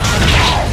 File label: slash; sukuna